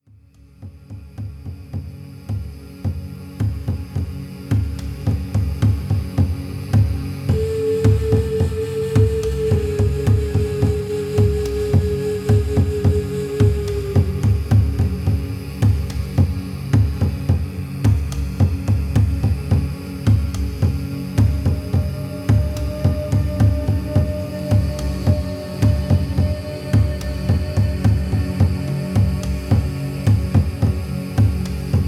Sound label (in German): Entspannungszeit Schamanische Meditation